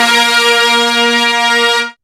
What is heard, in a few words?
orch